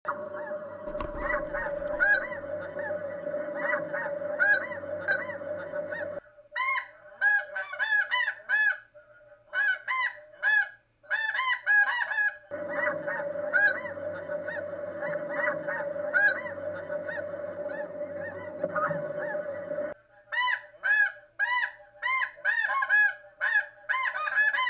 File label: geese then redbone